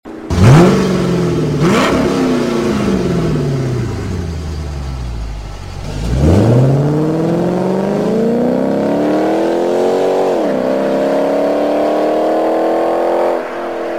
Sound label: Chrysler 300c Srt8